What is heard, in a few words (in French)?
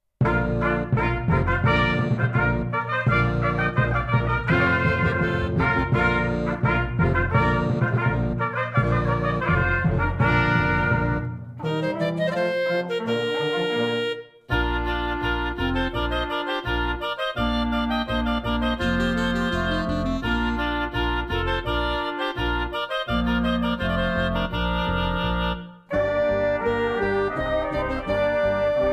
Air baroque; PETITE SUITE